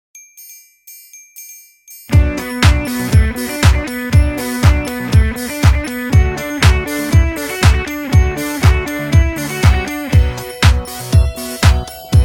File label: Happy hipster